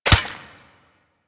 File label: Melee Dagger Impact Flesh; Warframe; Weapons; Daggers